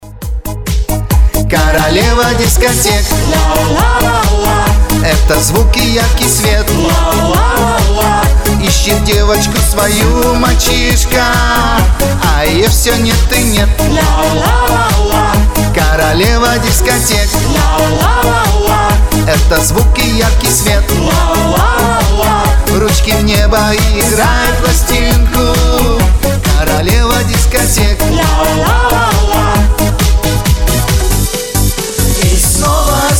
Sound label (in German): Russische Musik Hochzeit PARTY MIX